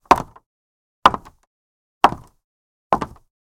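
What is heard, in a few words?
clog footsteps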